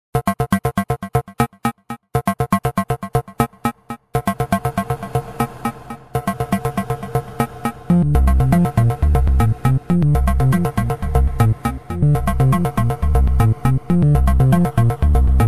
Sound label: pizza dance, synthesizers